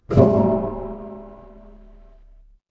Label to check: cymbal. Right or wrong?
Wrong